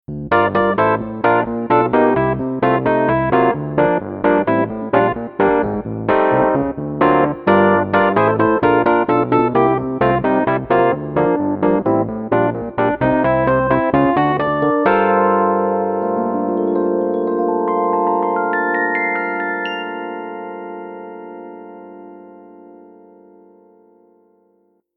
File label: Electric Piano 1